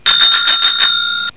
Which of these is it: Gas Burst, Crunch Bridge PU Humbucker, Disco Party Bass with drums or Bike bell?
Bike bell